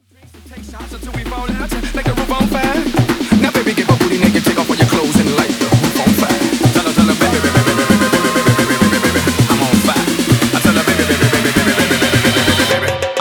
guaracha remix 131 bpm; Prodeejay Remix

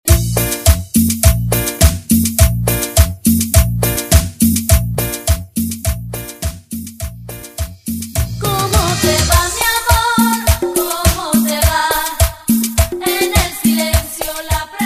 Intro Chorus 104 Bpm

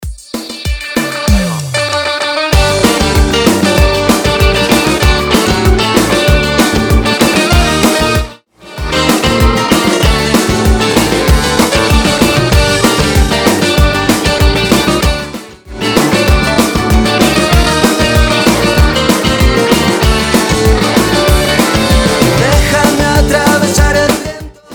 Intro Outro 96 BPM

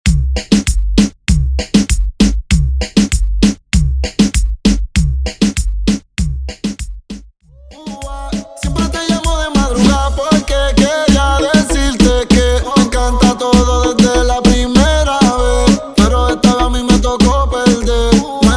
marroneo version 98 BPM

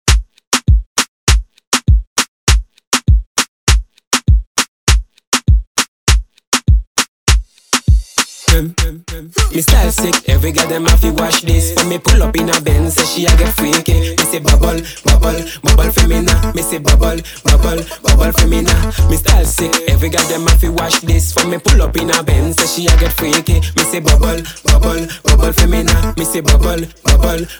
remix bpm 100

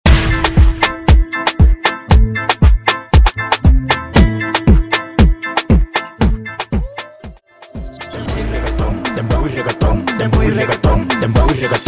Dembow Y Reggaeton Intro Break Outro 117 BPM

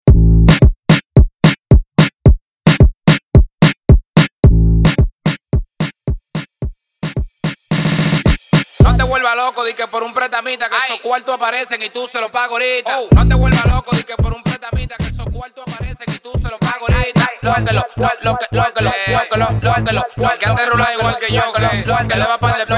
Intro Chorus 110Bpm

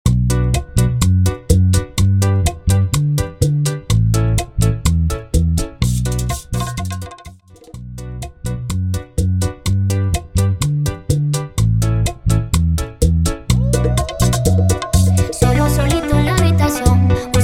Bachata 125BPM; Bachata Project

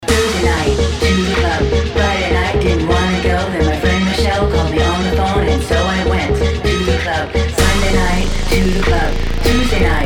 Remix bpm 128, tech house

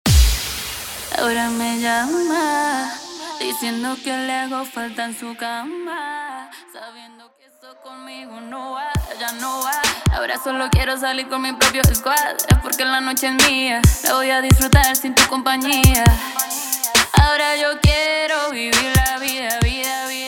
Acapella Starter Clean 135BPM

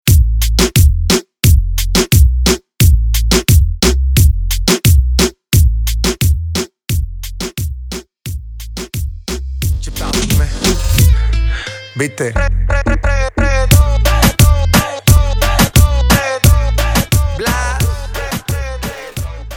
Reggaeton

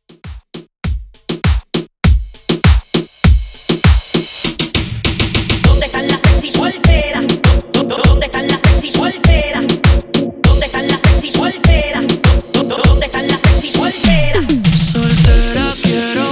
Remix Intro Outro 100 Bpm

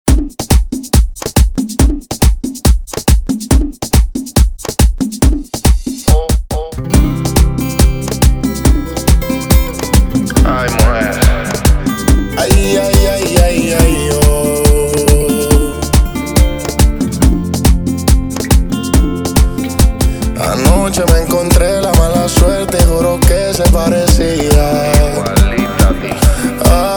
House Remix bpm 140

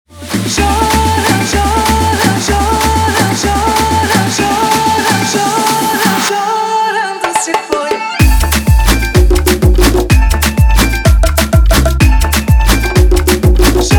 afro remix 126 bpm

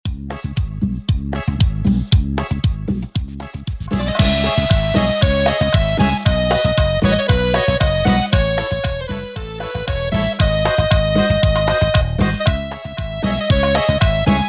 Rumba Lambada 116BPM

